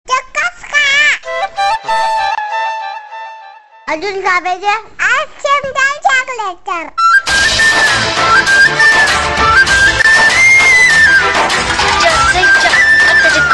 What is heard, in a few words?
kids, ringtones children